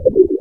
idle, roller mine; hl2

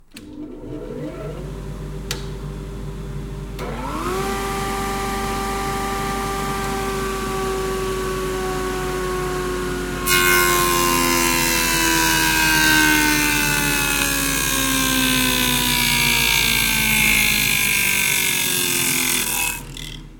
Rotary Cultivator; SoundBits; Electro-Mechanics ToolKit

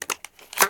shotgun reload; weapons; hl2

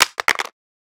Drop Smartphone On Concrete; The Sound Pack Tree; Dropping Things

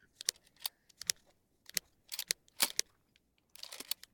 Shotgun Safety Switch On Off

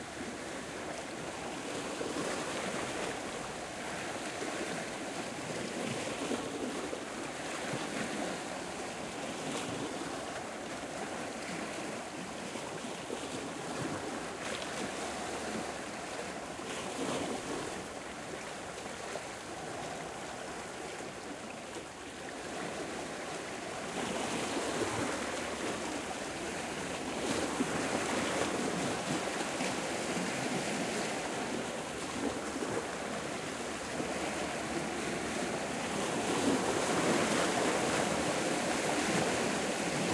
Ocean Waves Between Rocks; justsoundeffects

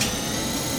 turret start, turret wall; hl2

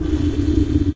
pod hit; hl2

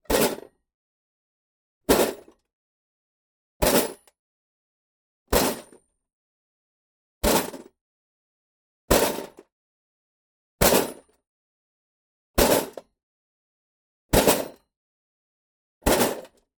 Toolbox - DROP; Pole Position; The Metal Hit Sweeteners Library

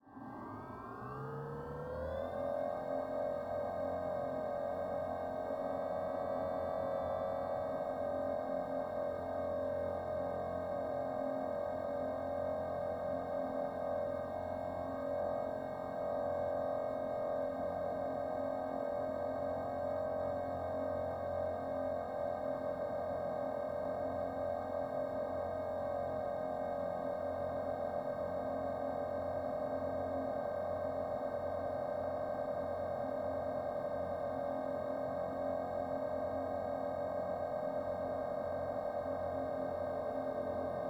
Quadcopter engine right constant coil mic; ShapingWaves

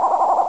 servo laugh; hl2; combot

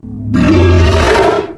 attack growl, ichthyosaur; hl2

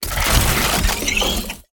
Creature Power On, Robotic Creatures; DavidDumais; Robotic Creatures Sound FX

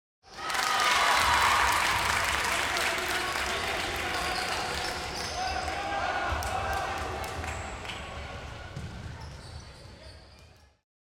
Crowd Applause - Light Applause, Cheer; Basketball Game; Basketball Game Pro; Sound Ex Machina

